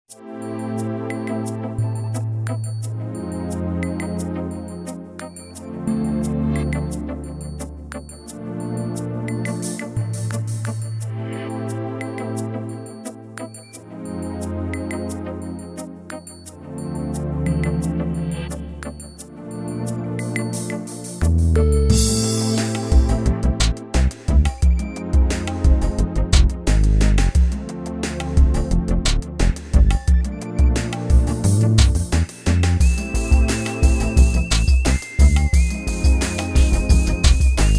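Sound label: groove; sy85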